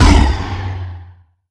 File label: hurt; Necromancy